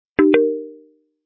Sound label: alert Ring